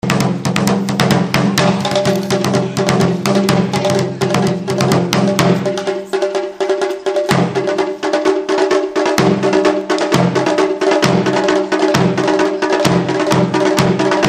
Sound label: taiko